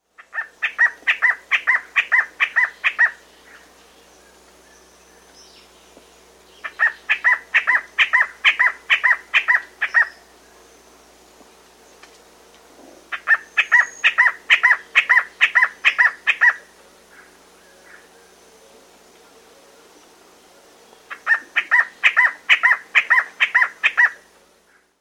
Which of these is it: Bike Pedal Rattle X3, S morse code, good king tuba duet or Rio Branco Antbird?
Rio Branco Antbird